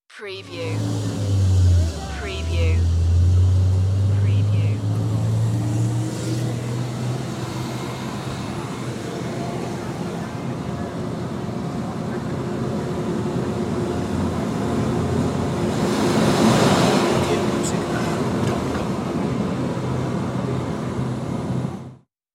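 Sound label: Shuttle Ride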